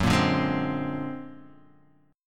A sharp Augmented 9th F sharp, guitar

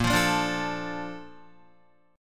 Bb Major 7th, Guitar; BbM7 strummed; JGuitar

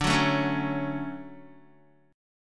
D Major 7th, Guitar; strummed; JGuitar

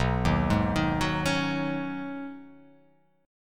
B 6th Add 9th B, chord

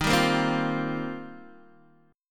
D sharp 6th Add 9th, Guitar, DADGAD

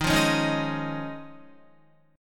Eb 7th Suspended 2nd Suspended 4th Eb, Guitar; strummed

